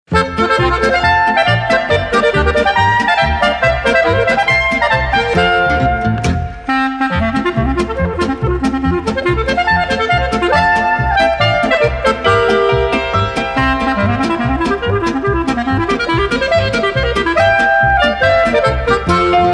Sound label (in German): Klarinettenzauber